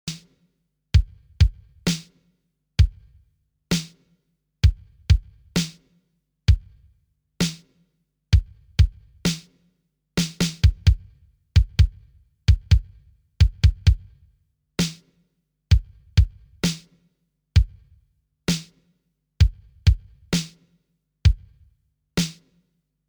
TR6S 626 Beat

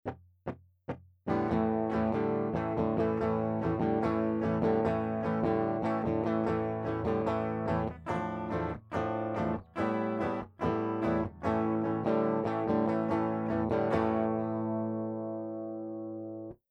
bypass E-Guit LineIn; Royer dBooster2; Fender

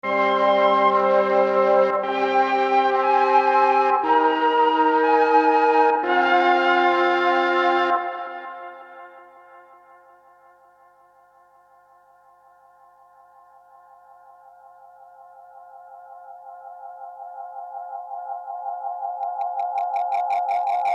RE-20 Space

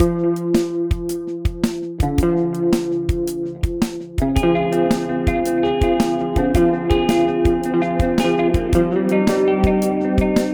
TC Electronic Plethora

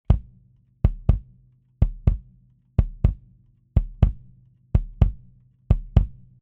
EV PL20 Kick Out

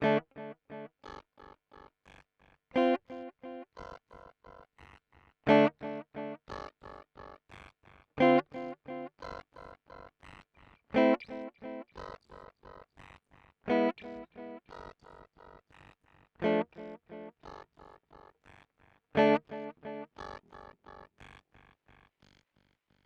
Meris Polymoon Late Modulation

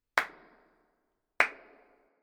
Twangstroem Claps Bunker Plate 3 Springs

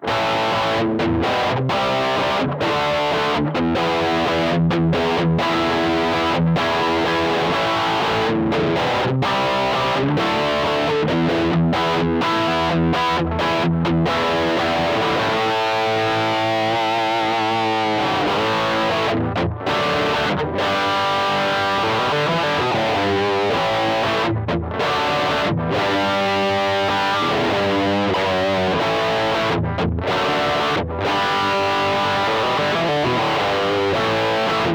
Capturing Quad Cortex Highgain Rhythm; Profiling versus Capturing

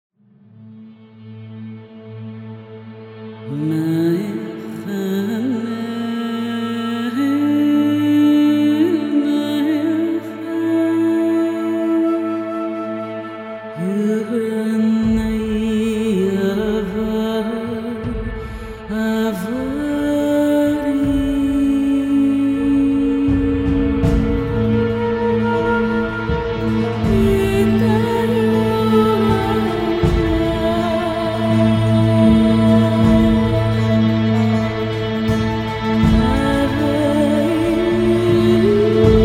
Lyrical Vocal Phrases; With Honor; Sonuscore

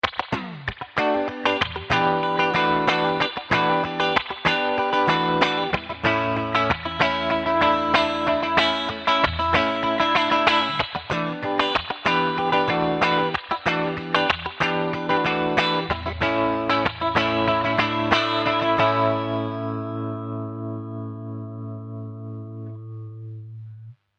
Vibrato Reverb

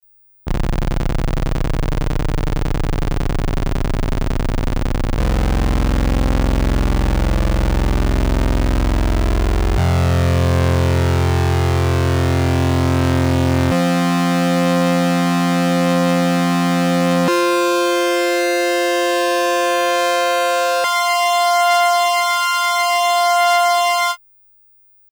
Korg Volca Keys Fifth

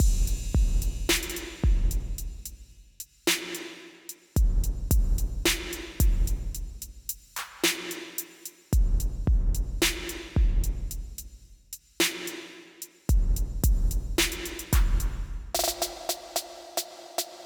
Drums Medium Hall; UAD

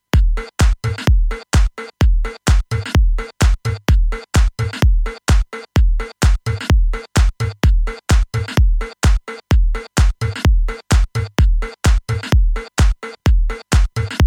Beat EQ

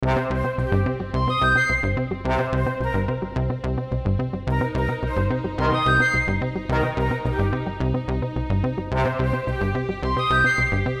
ARP 2600 V

